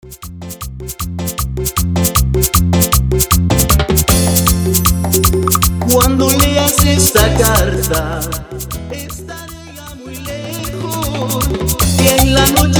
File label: Intro Acapela Bpm 155